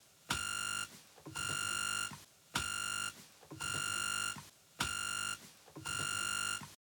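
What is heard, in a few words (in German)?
atomos shinobi Störsignal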